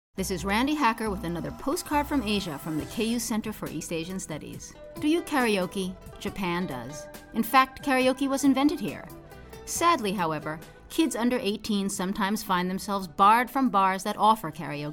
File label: karaoke